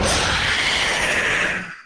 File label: death scream; fnaf 3